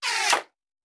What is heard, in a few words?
Bullet Impact; Sound Ideas; Guns